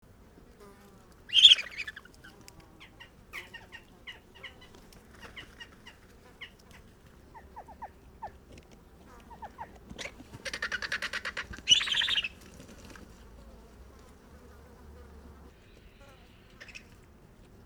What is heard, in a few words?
aegypius monachus; fernand deroussen